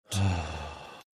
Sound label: sighing